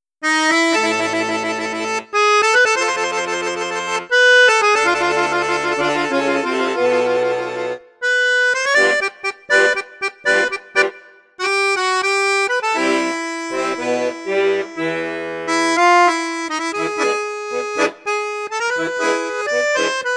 registre basson flute juste, accordeon; paolo soprani

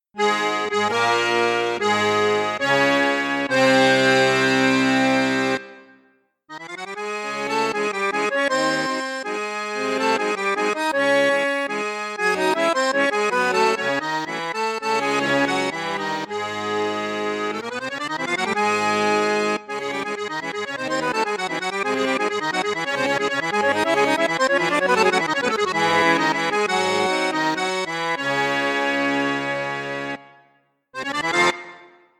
registre basson flute basse flute juste flute haute, accordéon; cavagnolo professionnel 10

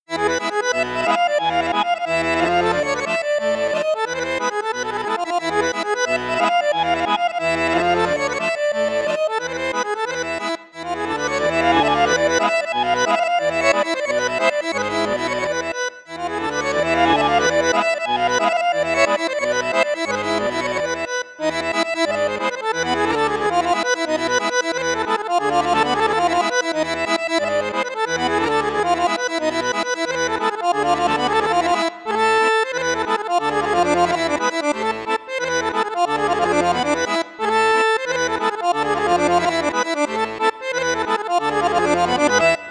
registre basson flute juste piccolo, saltarelle accordeon

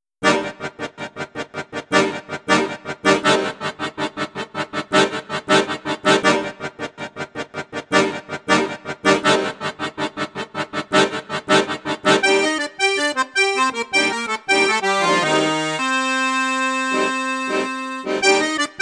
registre basson flute juste flute haute piccolo, accordeon; cavagnolo vedette 10

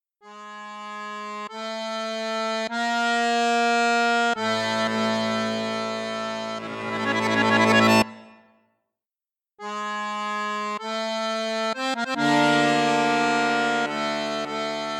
registre basson flute juste, accordeon; les yeux noirs; piermaria 315

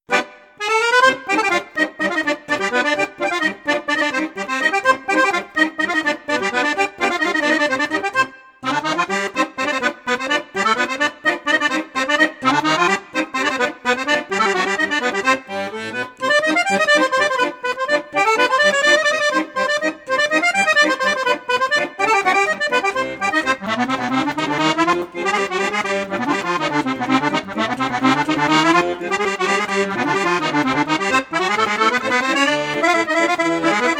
registre plein jeu; crucianelli

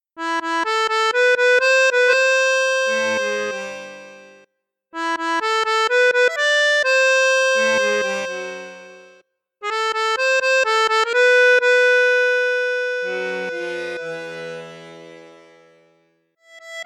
registre basson, accordeon; piermaria 315

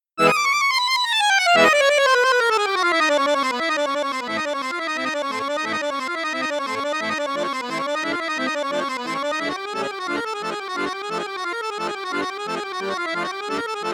registre 8 8 4, accordeon; borsini concerto medio

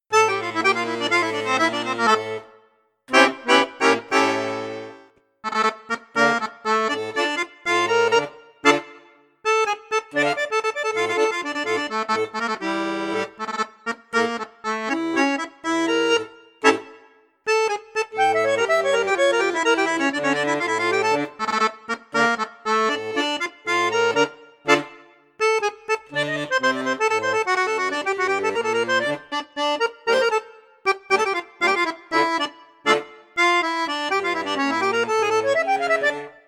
registre basson flute juste, accordeon; piermaria prodige

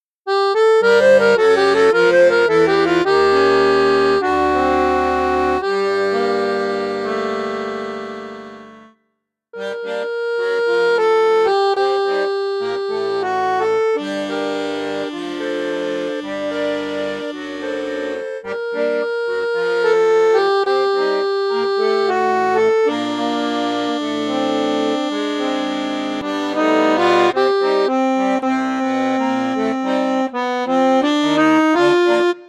registre basson, accordeon; piermaria prodige plus

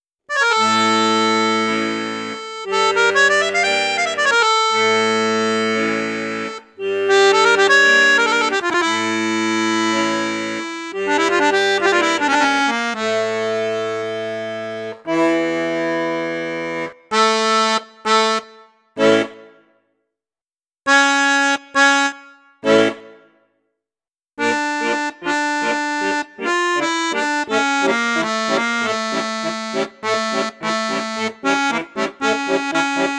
registre flute juste, accordéon; hava nagila; maugein export

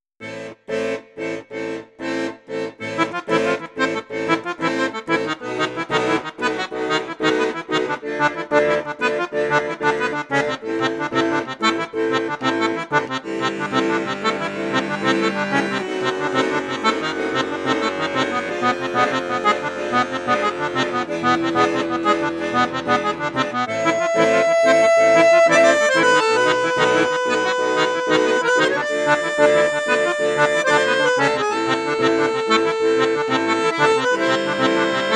registre basson flute juste, accordeon; accordiola

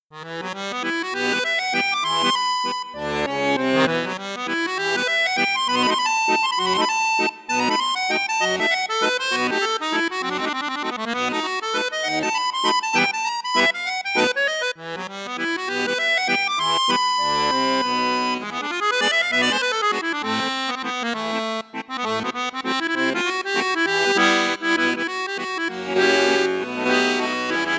registre flute juste, accordéon; cavagnolo professionnel 10

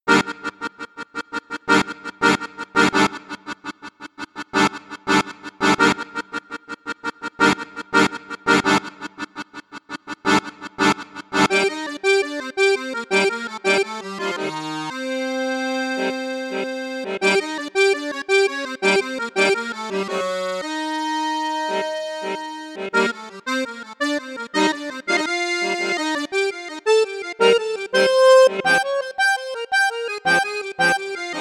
registre basson1 basson2 flute juste flute haute, accordeon; cavagnolo vedette 10

